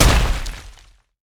anchorchain miss; nautilus

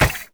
crit attack; LoL; badrobotamumu

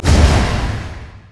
twin bite human; LoL; shyvana

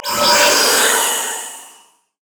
wraith angry; LoL; monsters

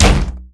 basicattack; LoL; twitch

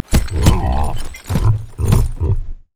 emote laugh; LoL; sejuani

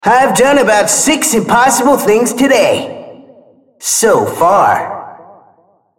attack; RumbleSkin03

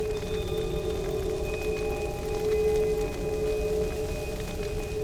loop; destiny; LoL; twisted fate

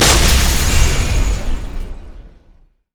hammer smash; LoL; taric

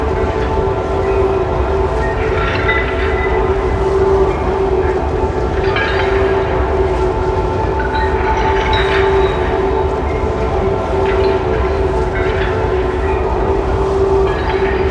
Altar locked loop; LoL; altars

